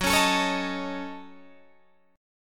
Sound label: Gb Minor 6th Gb Guitar Drop D; 16,16,19,18,16,17; down; chord shape